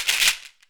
SHEKERA, African perc; AKAI S6000 CD-ROM - WorldSounds

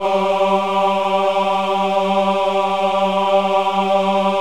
VIB G3, VIBRATO, Voices; Spectrasonics

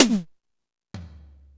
Toms 1, drum machine

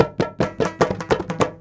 INDIA R, Heart of Asia, IND ENS

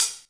Open Hhat, HiHat; Equipped Music; Loungin House

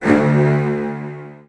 D1, Virtuoso Strings, 16TH; ILIO; Kirk Hunter; CL 16TH

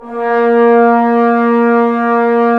BRS BNS, Roland LA Composer; Roland - LA Composer vol. 4; Tbns

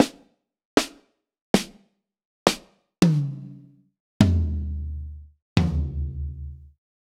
Kit Acoustic; HONEY BUN

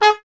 TP G#; Miroslav Vitous; Solo Instruments VOL-1

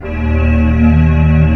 FAIRLITE G1; Northstar - New Gold VOL-1; M1 FAIRLITE

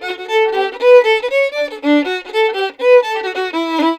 violin, Celtic Flavours

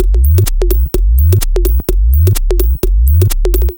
Gobble 127bpm, House Drumloops; 90 s Sample CDs; Zero-G House Fabrik Drumloops; ZG Drumloops

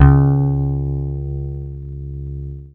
C#, Synclav FM PLUCKED; Big Fish Audio

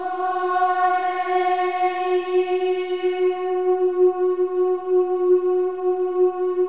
AH EH, Voices; Spectrasonics; Symphony of Voices; AH-EH-EE

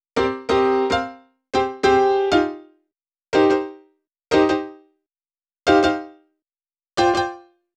Piano Solo, Cinematic Funk; Sony Creative Software; Urban Tone Poems

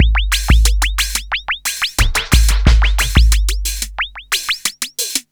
pulse code, 90bpm; Zero-G; Freak Beats

